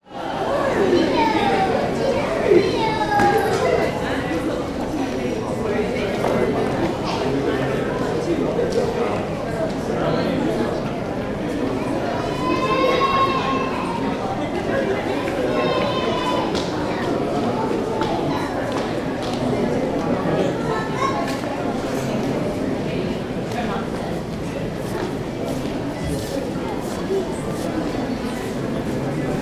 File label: public places museum national gallery internal ambience portico; tom chapman